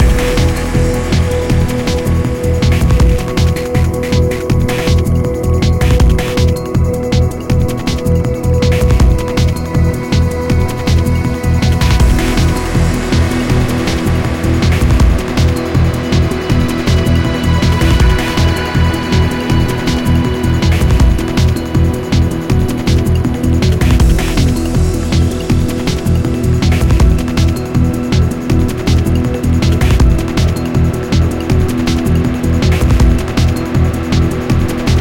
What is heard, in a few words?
chase; Mirror's Edge (2008)